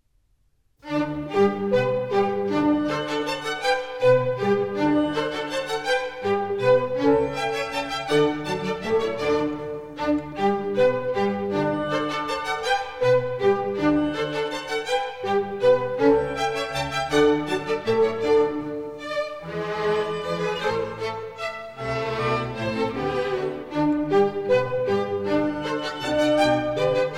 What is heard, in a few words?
Minuets, G Major; Mozart; Dances and Marches